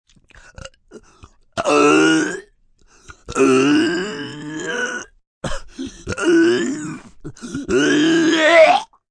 Vomit Dry Heave, Vomitting, male, dry heaves, gagging, gasping (human voice); Vomiting; VoiceBox